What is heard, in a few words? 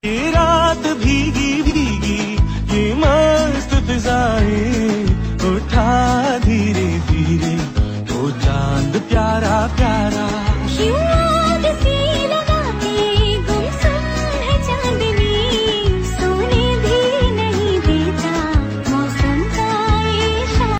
Romantic Hindi Song Ringtone